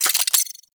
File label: Pistol Alt Fully Charged; weapons; Warframe; Thanotech